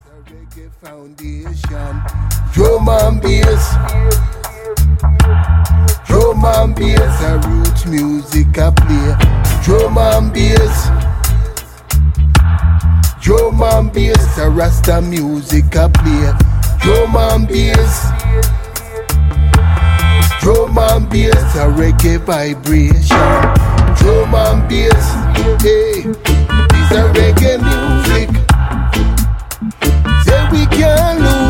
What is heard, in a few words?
Drum & Bass